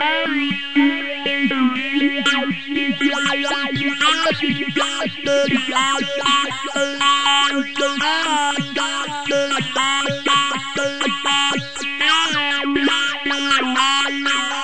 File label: 120 echoey synth melody filter voxy; SYNTH AND ELECTRONIC LOOPS